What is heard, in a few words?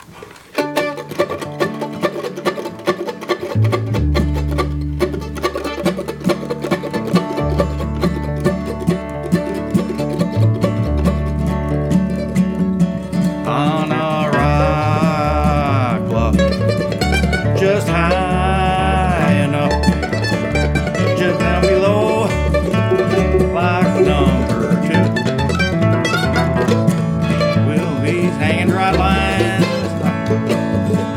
Stumble Key D